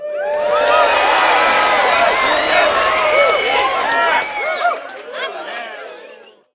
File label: Pier cheers